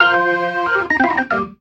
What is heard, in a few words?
RIFF, ORGAN RIFFS; InVision Interactive